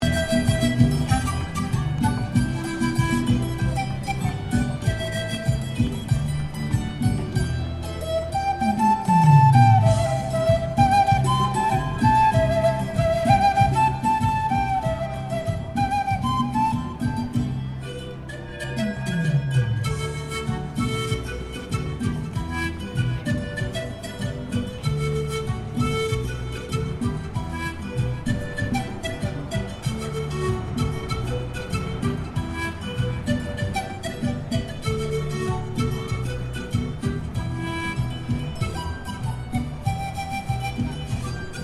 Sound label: Ambience Busker Pan Flute; Melbourne buskers; Concerts